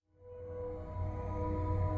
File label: Beat Start Amb Loop START